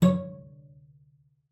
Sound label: C#5, CELLO FINGER; Xsample VOL-5